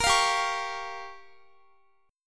G Minor 7th Flat 5th, Guitar; Gm7b5; strummed

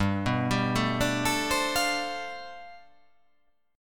G 11th

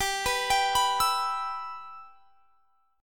G 6th; G6 arpeggiated

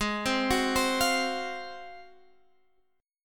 Ab 13th down Guitar Standard; arpeggiated; JGuitar

